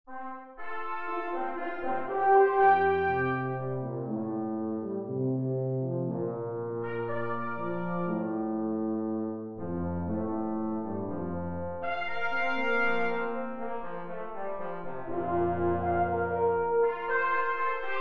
brass quintet; lepton

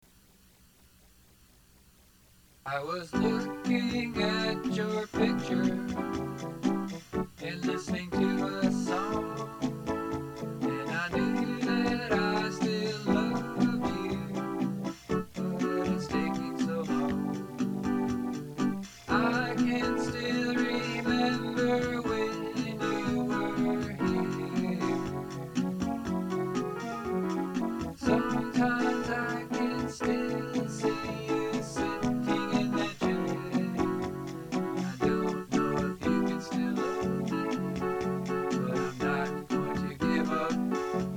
chorus

